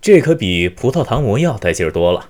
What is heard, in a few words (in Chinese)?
被治疗, BattleCry; 南宫三八